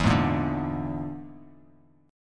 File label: E 7th Flat 5th, down, Bass Standard